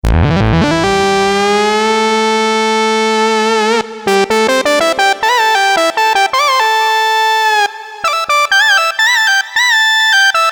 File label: LEAD; ROLAND SH-201; ClassA